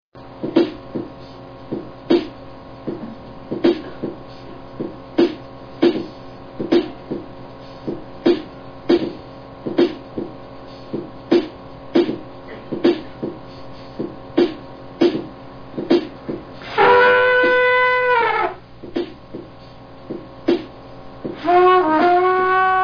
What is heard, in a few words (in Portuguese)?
sopro